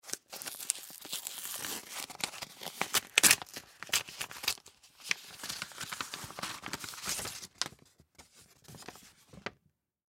Letter Open Seal Rip Paper Unfold; Letter Open Seal Rip Paper Movement Unfold; Office; Blastwave

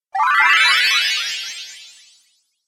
Rising Magic, Magic Sound Effects

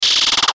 Chopper Zap Pulses; Blastwave; Chopper Sound Effects

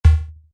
Digital Error Beep Wave Hit; Digital Clicks, Blips & Alerts; Digital Sound Effects

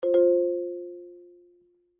Marimba Chime, Mallets; Musical; BlastWave

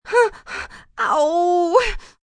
Pain Crying Ow, Pain, female, whimpery

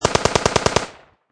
Machine Gun Ak-47 Long Burst Close Perspective; Machine Gun Sound Effects; LYN084 Guns & Military sound package

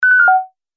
Multimedia Event Notification, beep sound effect

